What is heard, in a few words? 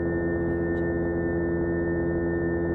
scifi spaceship interior background loop; stormwaveaudio